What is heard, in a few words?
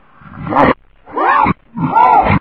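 zombie idle; svencoop; custom monsters; necroscasm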